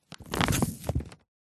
foley paper sheets stuck together wet glue peel apart